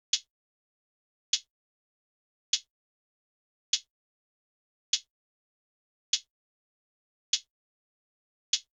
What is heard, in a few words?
Drum Loop - 100 BPM Hihat, Hip Hop; Drum Loops; Loop Stems; Cymatics; No Se Nada